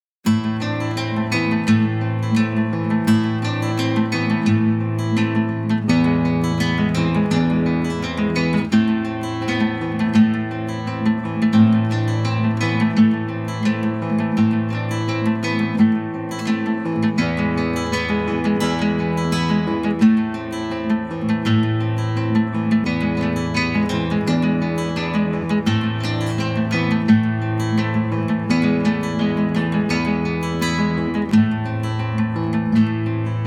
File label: tanz, Gitarre; klassisch